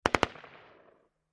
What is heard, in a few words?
M-16 Long Barrel Machine Gun Burst From Distant Point of View